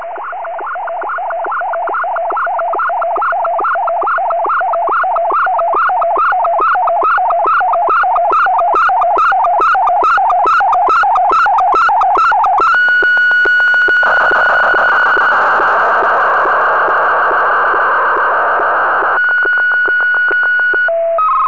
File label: sstv; rfi